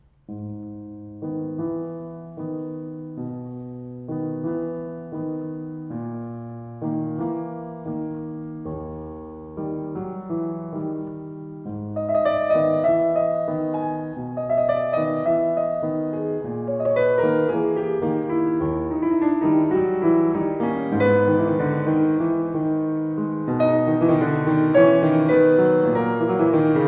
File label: Tango